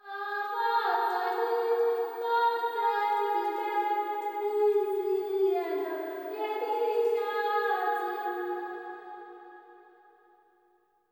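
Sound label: LITTLE GIRL, AFR. GIRL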